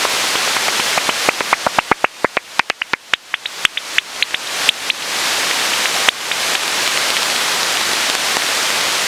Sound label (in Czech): netopyr hvizdavy Pipistrellus pipistrellus